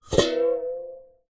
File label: household bowl metal water inside pick up water wobble